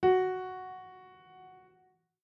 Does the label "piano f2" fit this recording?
Yes